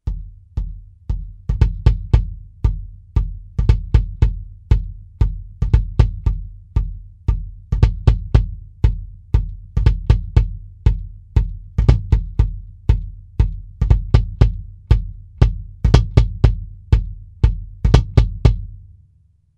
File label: BD inside BD